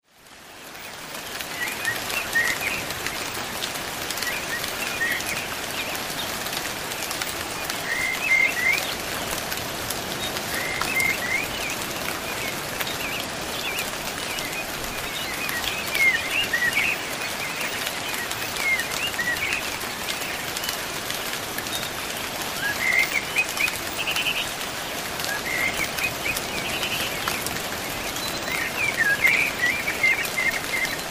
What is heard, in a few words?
rain; rejuvenation rain sublim